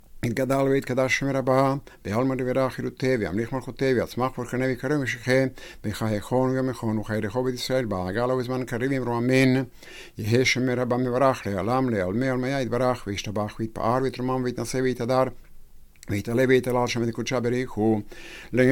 Kaddish; Kippur Neila

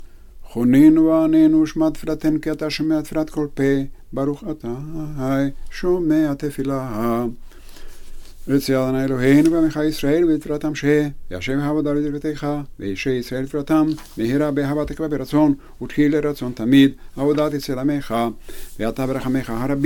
Amida closing, Weekday Minha

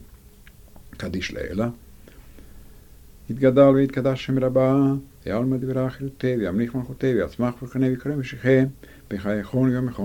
Kaddish; Shabbat Minha; Legnela

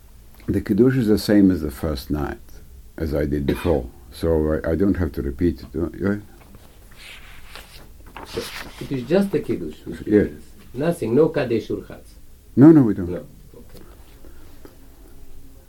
Kiddush; Haggadah

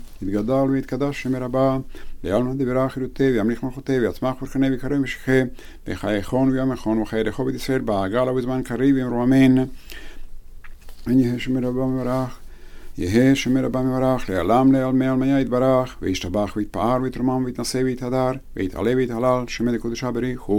Kaddish; Weekday Arbit

